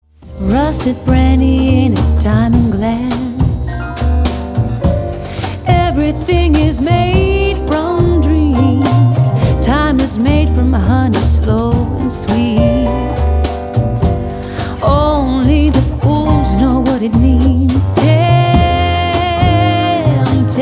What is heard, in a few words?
melodica